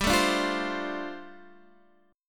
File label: G 7th Suspended 2nd Suspended 4th, Guitar DADGAD